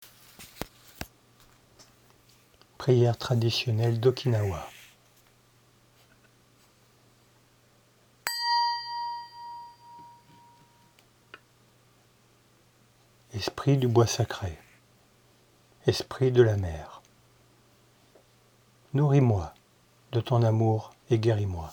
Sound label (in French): Priere traditionnelle d'Okinawa